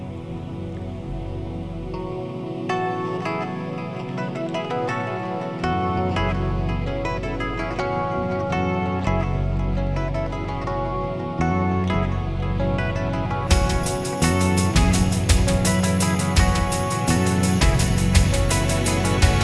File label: rock